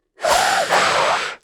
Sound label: Lizard war attack